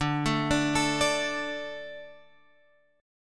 D 5th D; D5 Chord {10 12 12 X 10 10} arpeggiated

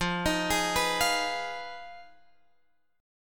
F Minor 7th Flat 5th, Guitar, Open F; Fm7b5; arpeggiated; x,12,15,15,14,12

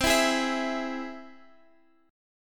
C Augmented down; strummed

